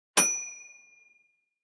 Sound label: Microwave Oven; Huawei HarmonyOS 2.0